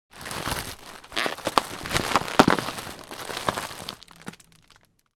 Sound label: Plastic bag crushing; gfxsounds